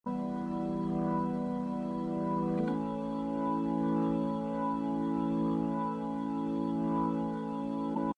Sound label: blues ringtone